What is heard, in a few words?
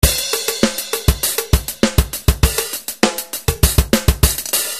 16 Hi Hat